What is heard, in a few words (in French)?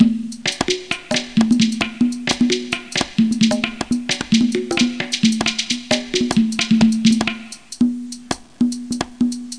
boucle musique maya; Mayas